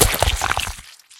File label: imp eat; hella farm